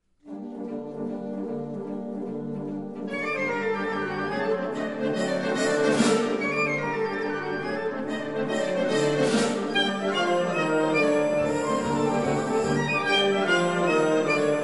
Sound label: Tarantella